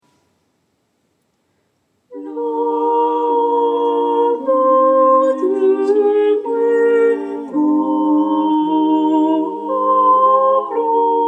tenor; L'amour de moy

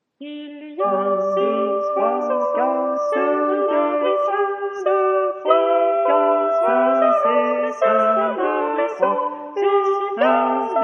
tutti; Oiseaux

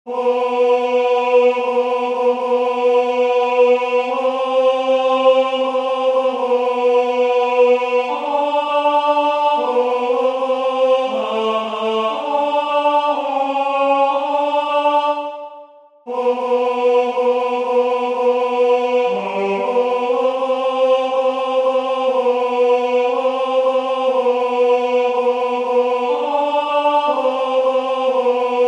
tenor

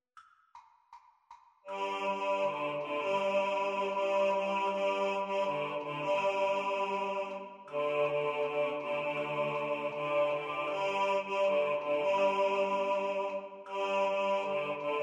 Basses Forte

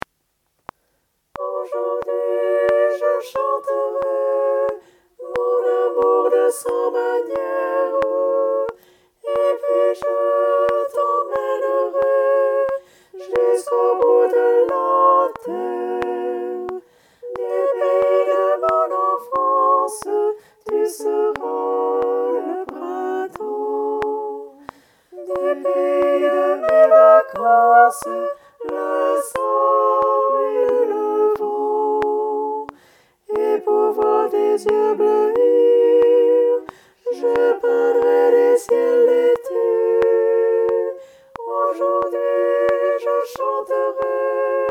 tenor

